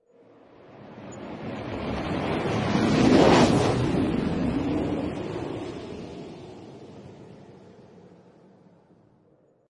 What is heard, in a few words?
booster